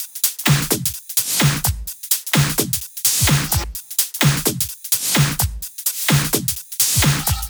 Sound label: 128BPM Kit; Vengeance Samples; VFH1 128BPM Australian Kit